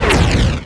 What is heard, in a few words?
run jump